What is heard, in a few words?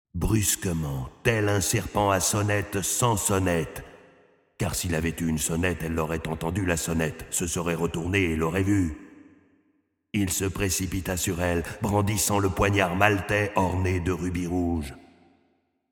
Off gore reverb